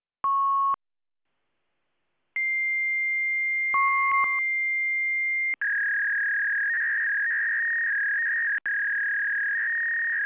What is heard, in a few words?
all rates failed